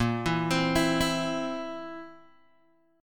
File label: Bb Suspended 2nd Flat 5th Guitar Open D